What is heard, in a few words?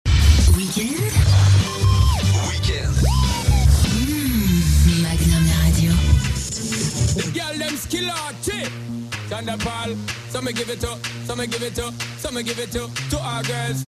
Jingle weekend; Magnum